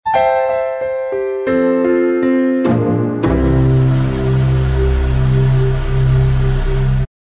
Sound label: a quiet ending